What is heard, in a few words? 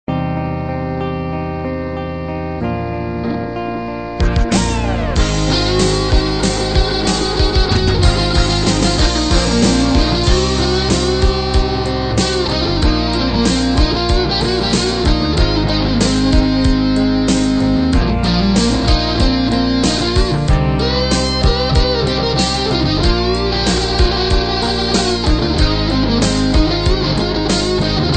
guitar